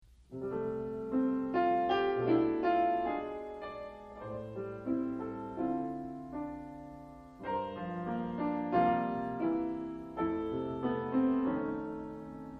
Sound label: PIANO BAR